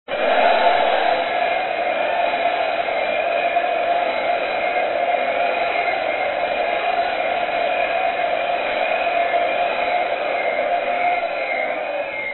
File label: cheering crowd